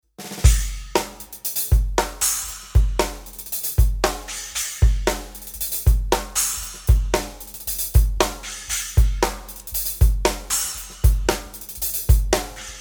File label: Zildjian Trashformers